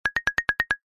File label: cartoon flutter eyelids shake